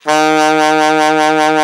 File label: ALT SAX V, D3; Best Service; Alpine Volksmusik